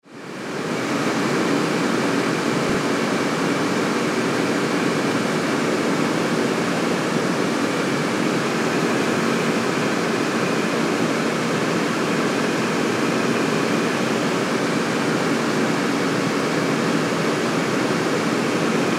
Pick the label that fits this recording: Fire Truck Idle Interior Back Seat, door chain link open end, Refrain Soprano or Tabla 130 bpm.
Fire Truck Idle Interior Back Seat